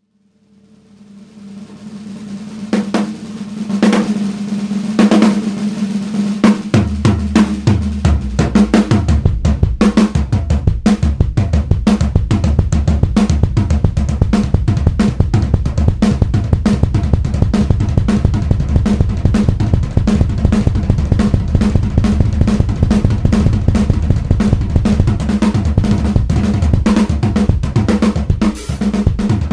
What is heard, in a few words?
Drum Mix